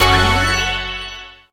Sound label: feature Buy; hotline2